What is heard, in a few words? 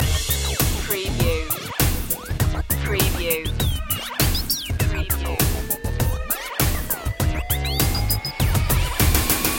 deep impacter loop